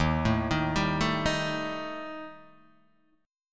Eb Major Flat 5th Eb Guitar Open D

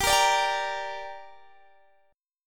G Minor G down Guitar Open D, chord

